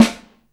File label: SNARE; Back In Time Records; Big Bang; SNARES